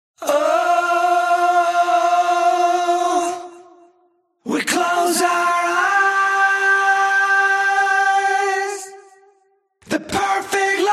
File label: Vocals